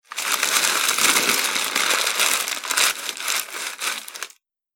Parcel Wrapping Paper Scrunchy Noise; Paper Sound Effects; Foley Sound Effect; Andrew Fleming

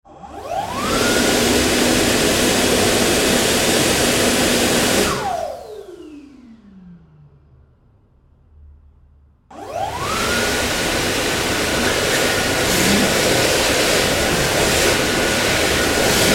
Electric Hand Dryer

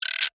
Gear Wind Short, Large, Plastic, Clicks; Foley; BlastWave

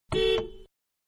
Small Car Horn Short Interior; Cars (Sound Effects:Vehicles); Manhattan Production Music

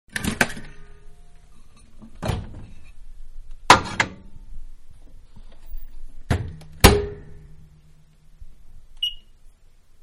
Microwave; Kitchen; Household; Manhattan Production Music

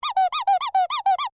Squeaky Toy Long, Foley, Squeaky, Toy, Long; Miscellaneous Toy; Toys; BlastWave

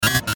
BLEEPS STUTTER; Beep; Multimedia; Beeps

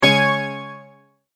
multimedia tone exclamation; McKinney Sound

